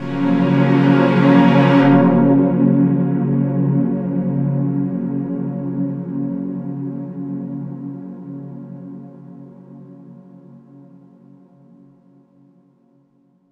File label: F#maj7, Chords; Electronisounds